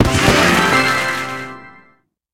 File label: Clear Success; In Clear Success